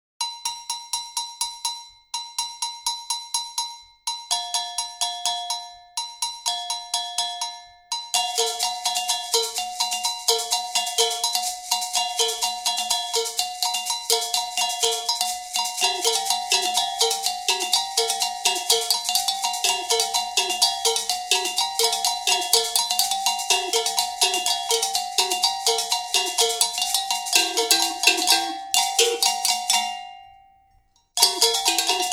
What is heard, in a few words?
Prelude Bells